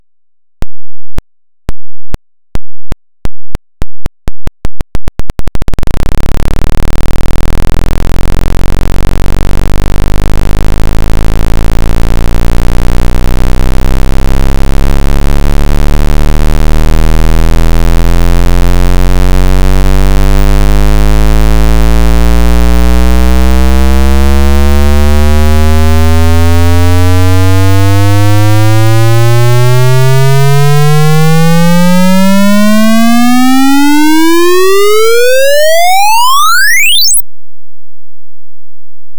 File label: pulse interleaving method parasite tone